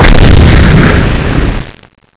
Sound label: TANK EXPL; SKRAP